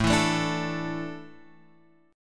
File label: A Suspended 4th down Guitar Open F; Asus4 strummed; Asus4 chord; JGuitar